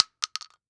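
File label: Weapon Bullet Shell 12 gauge Dropping On Cement; AdobeCC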